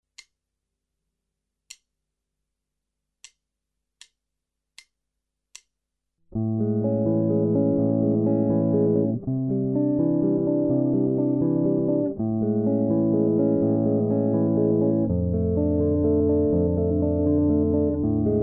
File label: guitar fingerpicking exercises jazz; fingerstyle tutorial